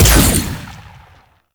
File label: Fire Shot; Warframe; Weapons; Duviri; Incarnon Adapters; Incarnon Dex Sybaris